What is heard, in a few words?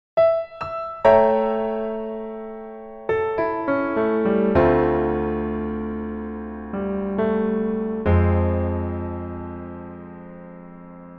Piano ver